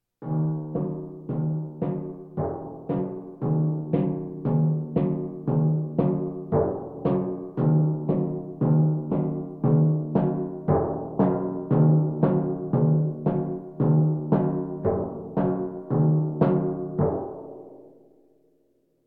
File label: Percussion Bongos Tympani rolls Gongs Drums